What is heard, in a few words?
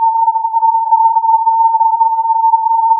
filtered input